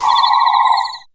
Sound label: synth c, Ambient Atmos; Dance Synths